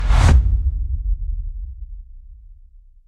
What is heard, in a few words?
Cinematic Whoosh Transition